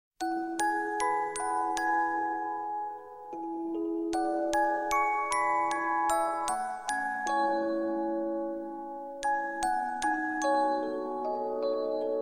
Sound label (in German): Orgel